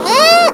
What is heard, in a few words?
COW, ANIMALS; FAIRLIGHT CMI IIX